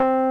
ELEC. PIANO; OMI - Universe of Sounds

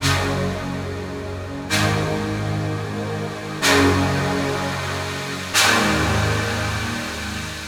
Nord, Pads; Sonic Foundry (Sony Creative Software)

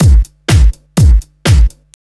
HOUSE 124, house loop

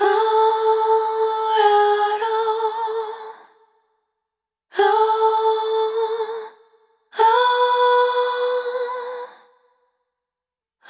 Multi-Voice Loops, Vocal Samples; Sonic Foundry (Sony Creative Software) - Pocket Diva World Class Vocal Samples

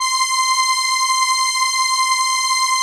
ARP C5, STRENS; Sonic Reality; Vintage Timetraveler

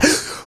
Voice Stick; Roland BK-5

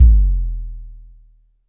Drum X Sub, Pearl Drum-X

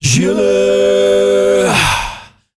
male 5ths, S. African world voices; ILIO; Vocal Planet VOL-5

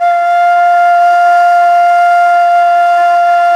NYE FLUTE; Nye Flutes

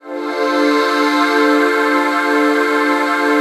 PAD, Dance Mega Synth

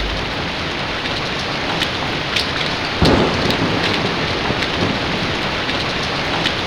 FX; AMG Megabass REMIX! VOL-2

